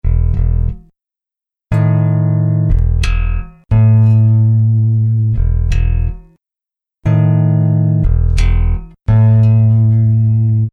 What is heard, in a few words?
Hip Hop Bass Guitar Loops 90 Bpm E